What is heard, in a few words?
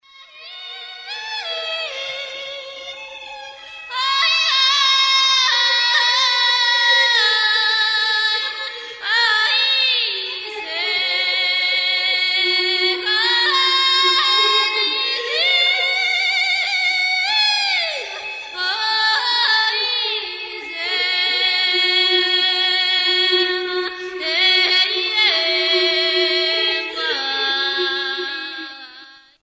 Hymn